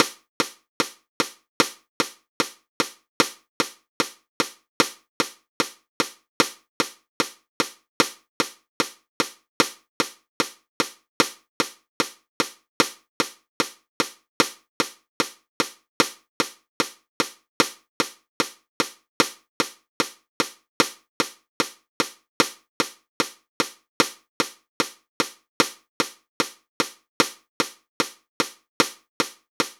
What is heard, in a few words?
jam click